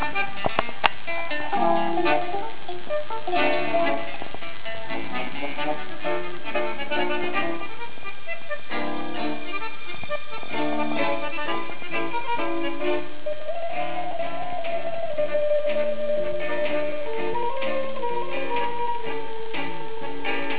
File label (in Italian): chitarra romana